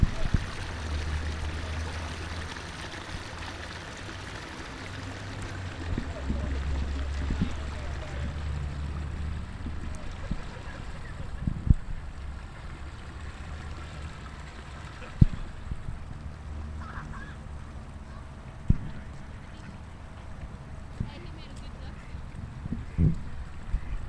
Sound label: Nature Sounds; Richmond